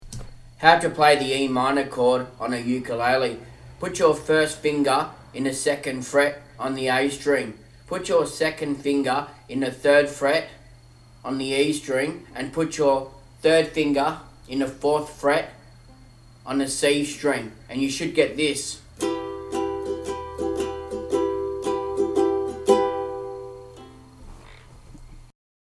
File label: E minor Chord on the ukulele